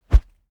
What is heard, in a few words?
foley whoosh wood cornered whizz bass